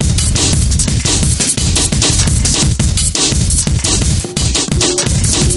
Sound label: breakbeat